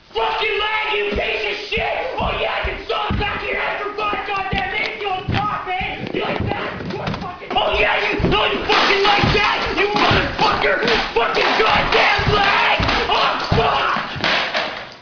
wheel fall

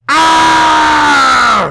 aah

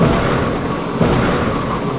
big pump loop

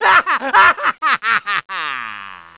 haha

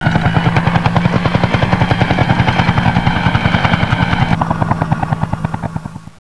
copter fly; ambience

